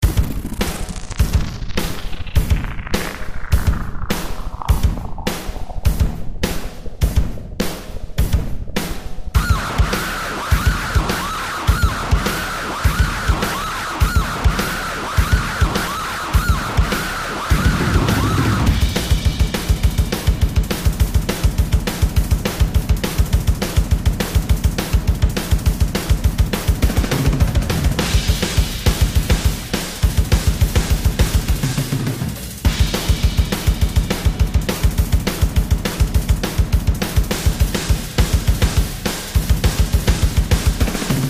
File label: Drums & Sfx